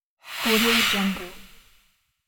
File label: demon exhale with echo